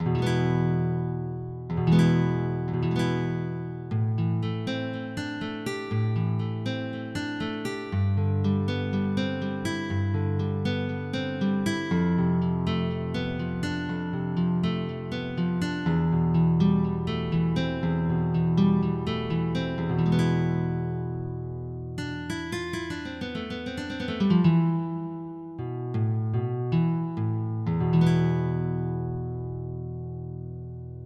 gm guitar; 3800 MIDI Magic

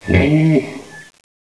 jungle; Renaissance

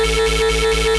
Sidewinder III; A-10 Attack! DEMO

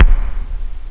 KICK; OctaMED SoundStudio V1; drums misc

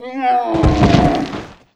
death camel; Alexander

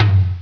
HIGH FL. TOM; Dark Forces Instruments 1

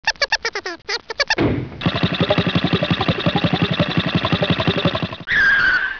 truck

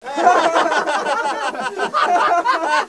all laugh; CD Mania

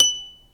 harp F#; Timidity

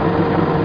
MOTOR

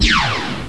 Long Laser

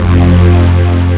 aero

